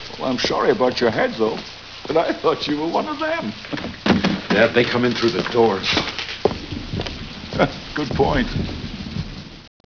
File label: indoors